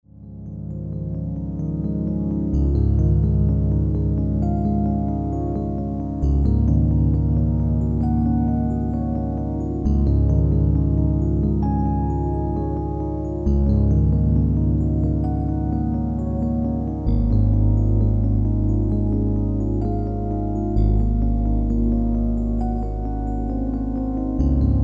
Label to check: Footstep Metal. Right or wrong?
Wrong